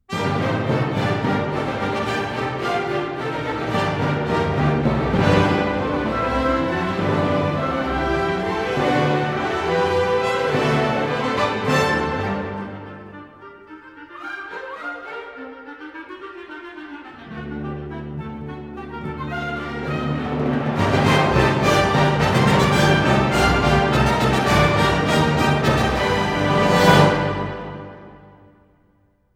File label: Allegro Di Molto, Classical; Elgar; Enigma Variations - Cello Concerto - Serenade For Strings